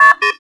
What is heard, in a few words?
Swipecard; Playable Tutorial Level